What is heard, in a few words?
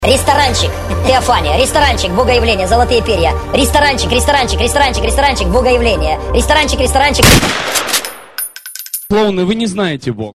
Ringtone